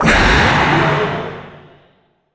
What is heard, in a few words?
cerber die